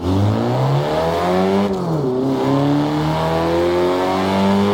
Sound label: first, vehicles; ren meganers